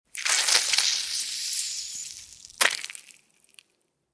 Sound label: Squeeze a lemon